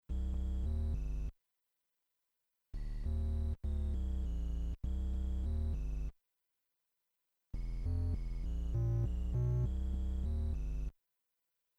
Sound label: SID Tension